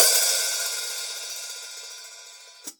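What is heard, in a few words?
Drums Acoustic, raw kit overhead; AKAI; 90s sample CD; USB Soundscan vol.10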